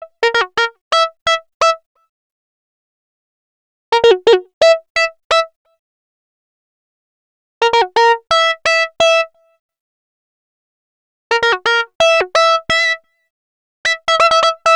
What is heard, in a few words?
Euro Lead; Sonic Foundry (Sony Creative Software); Afterhours EDM